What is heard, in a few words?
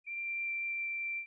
trumpet